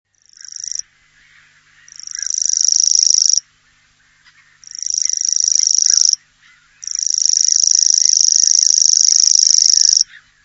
locustelle tachetee, chant

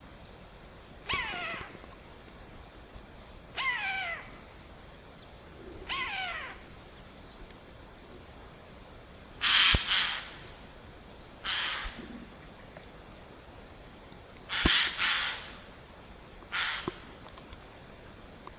geai des chenes; chant

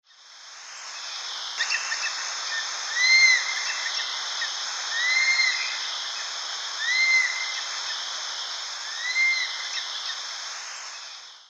aigle huppe, chant

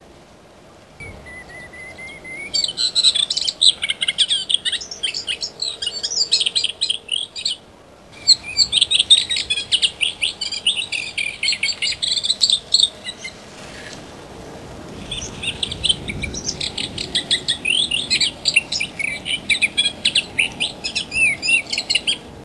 lusciniole a moustaches

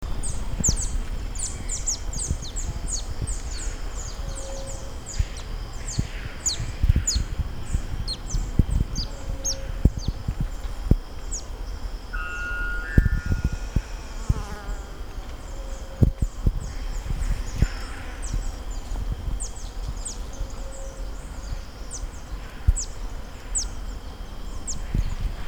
martinet polioure, chant

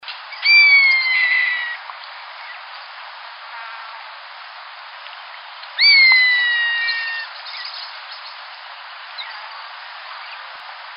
buse roussatre

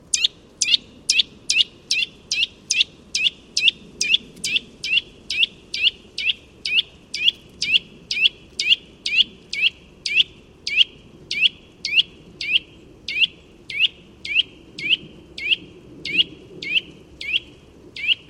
sittelle torchepot; chant

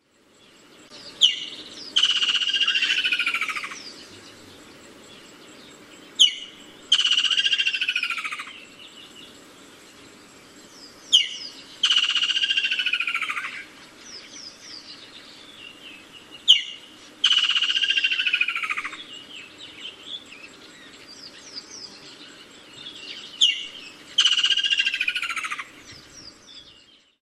martin-chasseur du senegal

